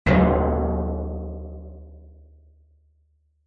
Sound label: impacts metal hit drum gong like